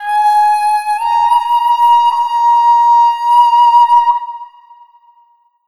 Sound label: SHAKU LP, Asian Traditions; Soundscan vol.60; AKAI; SHAKU LPS